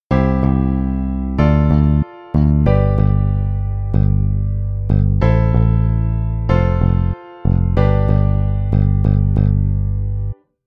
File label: bass chord